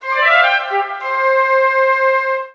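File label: orgn fx, keyboards; Musical Instruments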